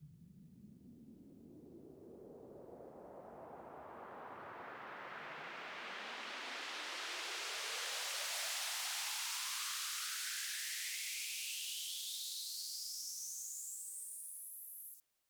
FX Uplifter

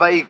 LoFi Vocals Oneshots; Vengeance Samples

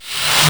Short Fills & Reverse; Vengeance Samples

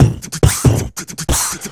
Beatbox Loops, Human Beatbox

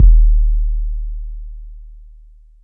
Bass Drop, 808's; Street Anthems Sound Kit

